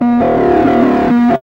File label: FX Dance